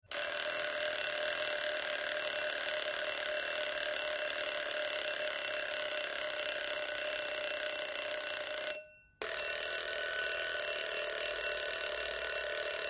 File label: Alarm Cooker Timer, Alarm Ringing - Cooker Timer - wind up type; Alarms; Electronics; Tom Hutchings